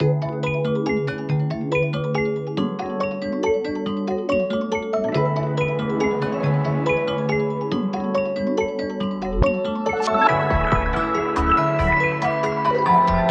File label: breathe freely